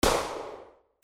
WEAPONS

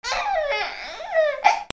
PEOPLE

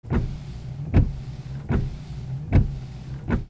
CARS

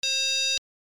BUZZERS

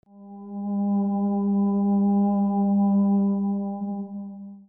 MUSICAL

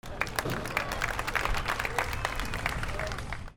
CROWDS

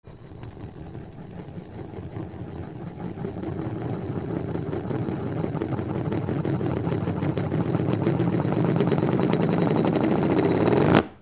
FALLING

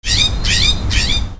BIRDS

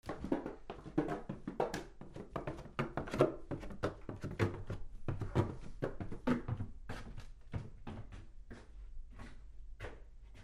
FOOTFALL

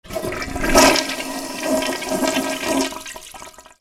BATHROOM

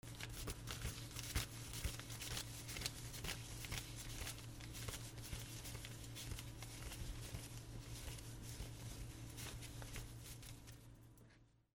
MONEY

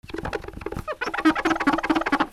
SQUEAKS

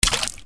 WATER

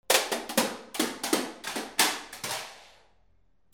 RHYTHMIC

